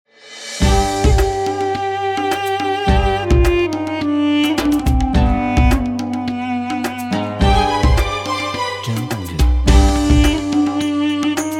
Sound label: Arabian Ramadan